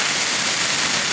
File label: fuze; Crazy Machines; Играет вся семья